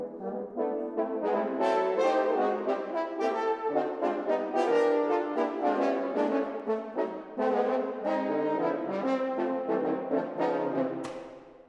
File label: horn quartet; charlseton